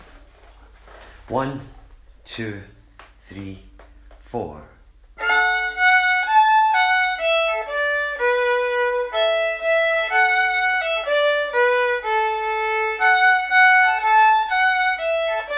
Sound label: Hebridean Waulking Song; GFW Slow Session